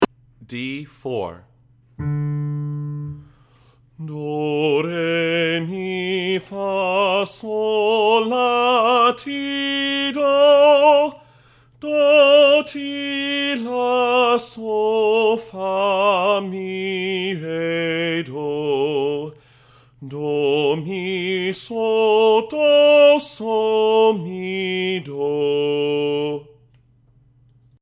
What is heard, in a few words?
Scale Bass